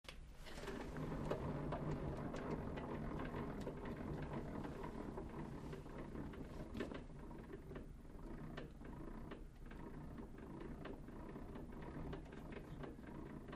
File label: Victrola Loop, record players